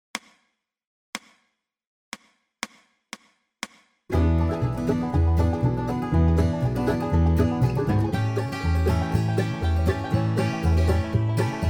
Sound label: 120 BPM, banjo